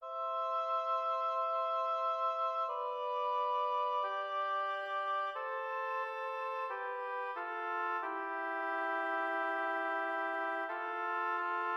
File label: beginning oboe duets c major